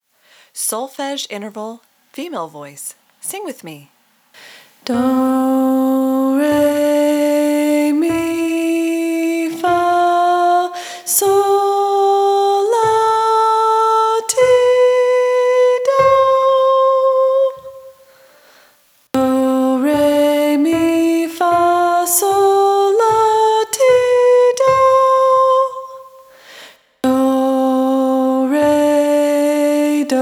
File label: Solfege Interval Training Female, vocal warm up, singers